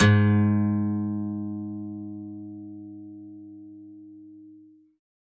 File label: g sharp, guitar